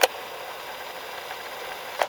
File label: tape next